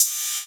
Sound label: Ride, Cymbals; Vengeance Samples